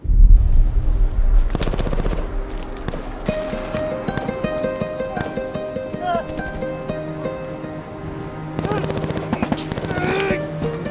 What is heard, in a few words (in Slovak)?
hudba a ruchy; post-bellum